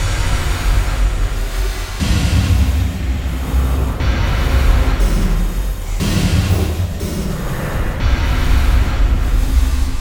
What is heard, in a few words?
Danger Tonal; Insanely Twisted Shadow Planet